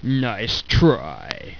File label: DEFEAT; Monster Media Number 15; os2